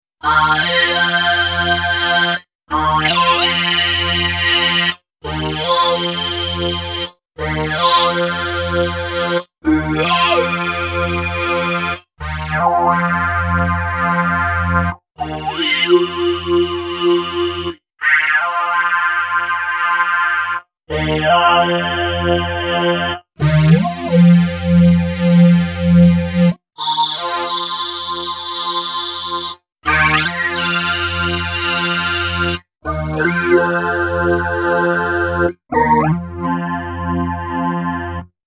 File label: Morpheus Formantfilter